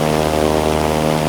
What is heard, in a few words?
Plane Prop Loop; Airplanes